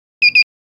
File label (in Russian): Ключ не опознан 2коротких